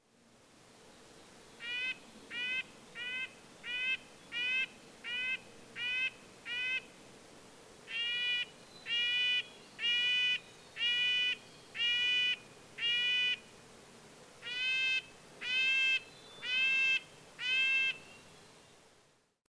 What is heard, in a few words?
Red-breasted Nuthatch